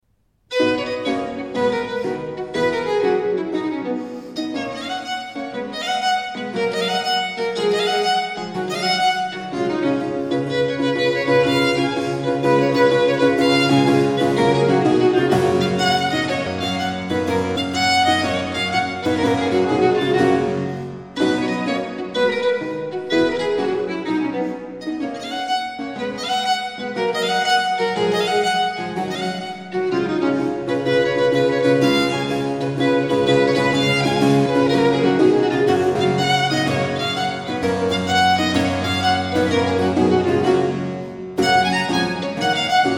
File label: violin sonatas allegro